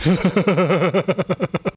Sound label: Best Laugh Ever